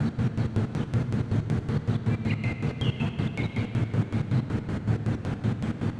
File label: AMBNT 160; Ambient